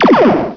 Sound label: Player Torp; Missions⁄Reliant!